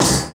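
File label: zang, metal impact; Total Control - Action Cutz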